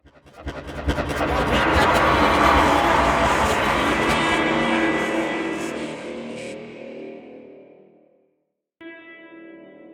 FX; LiLA'c Records PsyTrance Sounds 01

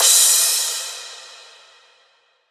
Crash

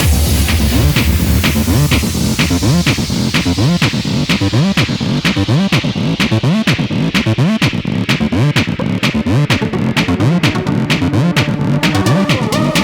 instrumental

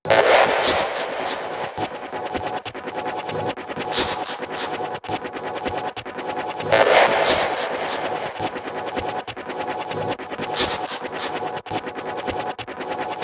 Loop, PsyTrance

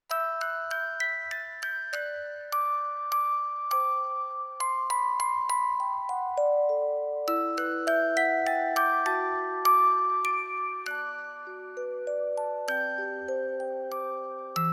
Music Box Ver

